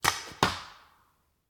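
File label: Paintball Gun Impact; Sound Ideas; Guns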